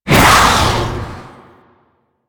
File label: Baby Dragon Split Shot Cast; Enemies; Warframe; Duviri; Void Dragon